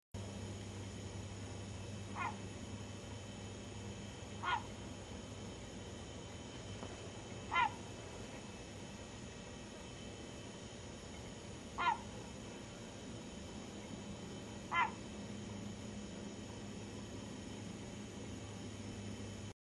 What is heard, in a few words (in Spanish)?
gavio coci; cantos; Gaviota Cocinera; Larus dominicanus; Kelp Gull